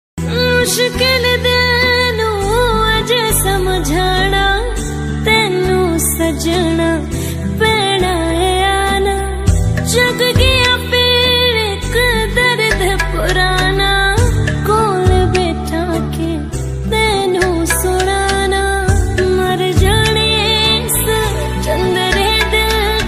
bollywood ringtone

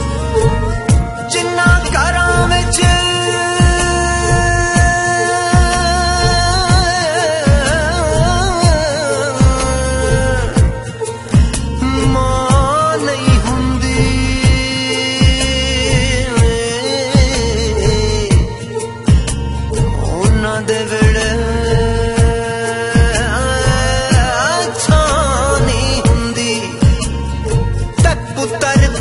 punjabi ringtone

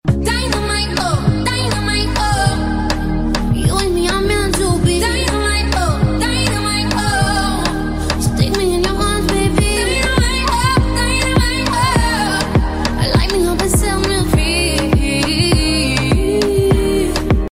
beautiful ringtone

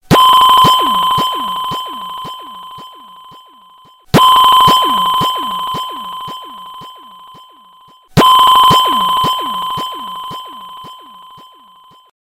galaxy s8 alarm music ringtone

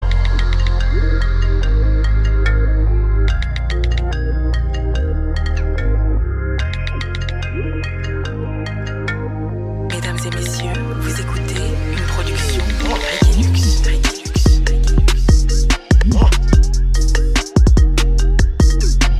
cool iphone x launch ringtone

